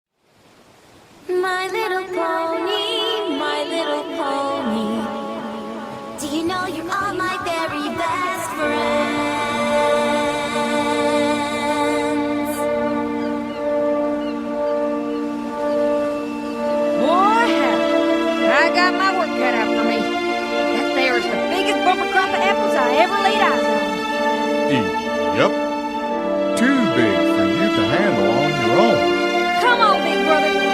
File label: Orchestral; Sweet Plastic Acres